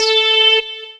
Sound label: lead syn, Techno Trance